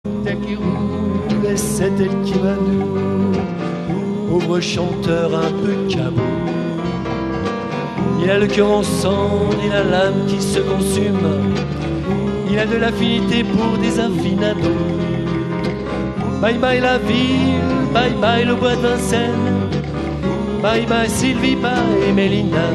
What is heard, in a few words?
BOSSA